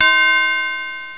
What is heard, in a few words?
confirm; OS2; BONUSPAK